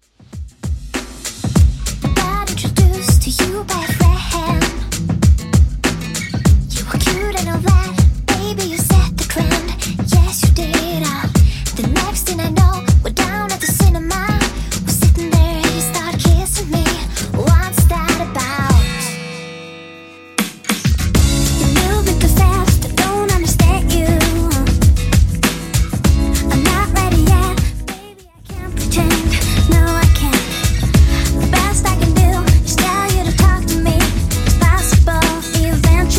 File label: 90s Redrum 98bpm